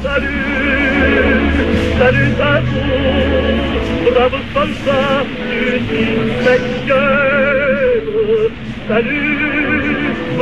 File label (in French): Chanson